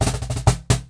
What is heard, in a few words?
Cajon Fill; musicradar